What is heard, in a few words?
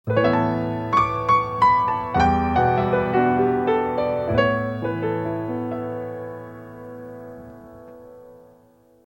Piano Stings Autumn